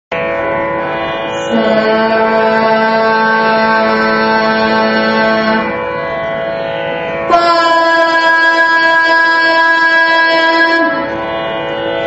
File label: kattai shruthi, carnatic music